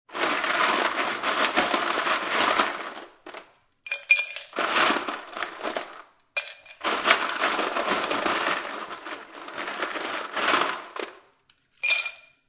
Foley, Ice Cubes Grabbed From Freezer And Placed Into A Plastic Cup; Drink Mixing